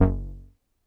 Deep Bass f#1, Korg EX-800

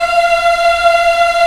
VOX African; Roland LCDP11 Africa VOL-1

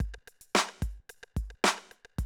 Classic DrumLoops, 110

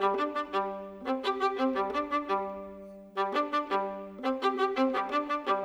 Viola, Orchestral; Sonic Foundry (Sony Creative Software); Orchestral VOL-2; Modern 09

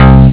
bass sound; Amiga Soundtracker Sample Packs

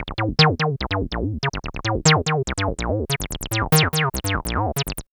BASS, TECNO; AMG - Vince Clarke Lucky Bastard

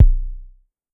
Kick 909, Drum Machines DDrum-AT Pitched Down

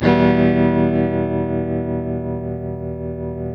TELTMDMIN F, TELE CHORDS, Guitars; Spectrasonics; Hans Zimmer Guitars VOL-1

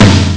Tom Drum; ST-XX Amiga Soundtracker Sample Packs

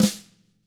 TAM3 FL, Drums, TAMA; ILIO; Double Platinum Drums 1; TAMA+TAMA

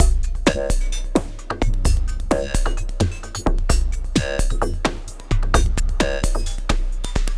Terrestrial Hybrid, Processed Percussion

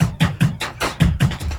LOOP, Drum & Bass, 150 BPM

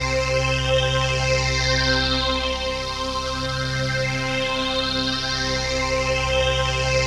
PHASE PAD; Best Service; XX Large Pads

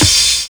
BUZZ BEAT; Univers Sons

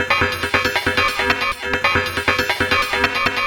Rhythmic; Sonic Foundry (Sony Creative Software); Underground UK House & Electro

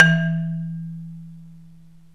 MARIMBA VIBES

